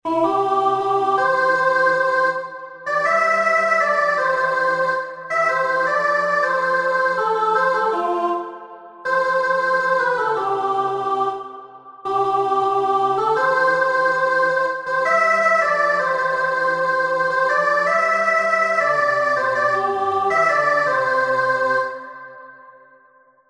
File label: Chorus Tune Sop